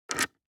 DICE on Hard Wood, Grab, Standard, 5 Five Dice; Samples; ArticulatedSounds; DICE Sfx Party (Extended)

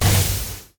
leg hinge; Apotheorasis

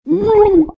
about To Drown; RadwinIsland

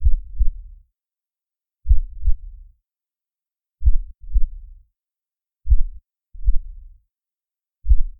Heart Beat Stop; DeadFright

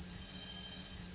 Spaceship Canopy Loop; SFX Kit; Sci-Fi Loops

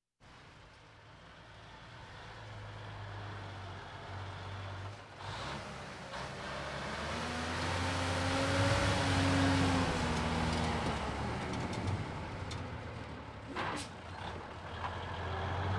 Military vehicles

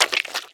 hit human organs; Blind Drive

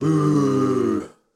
Crowd Booing; Pitch Black Audio Pong

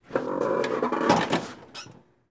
Theater Seat Squeak Sit; SFX Kit

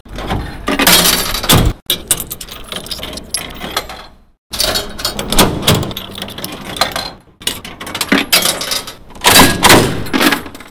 automate cigarettes; best service Blue Box; mixed sounds long

